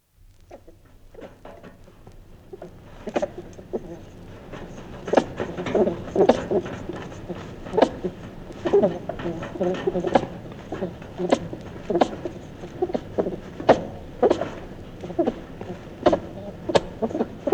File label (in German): Siebenschlaefer Geraeusche; Wildtiere in Europa; nagetiere